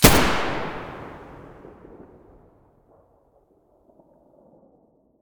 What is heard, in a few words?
muzzle g36 mono, weapons